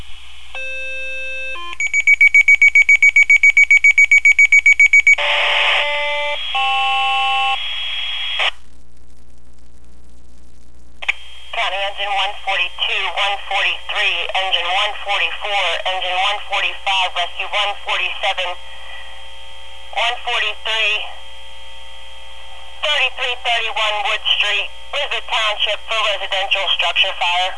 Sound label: structure fire